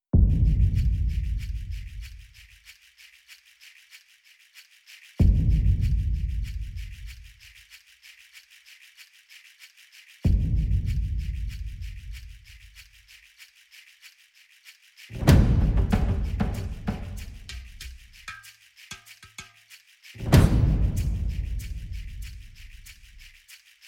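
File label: percussion only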